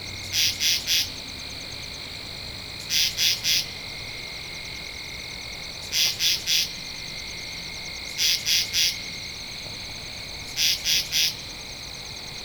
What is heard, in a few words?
Katydid